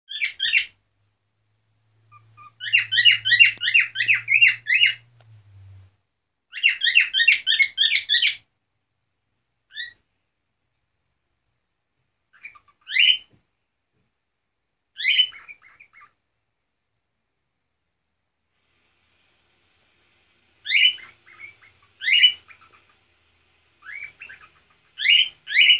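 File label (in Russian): popugaj korella golos, cockatiel parrot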